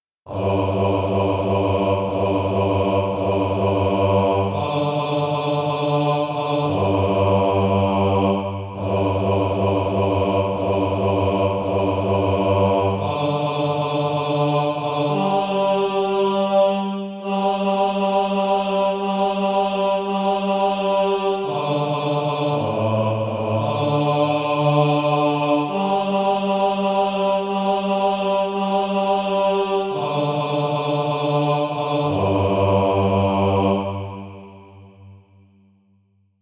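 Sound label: bass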